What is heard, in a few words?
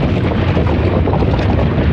Scrape Wood Crate Loop; Doors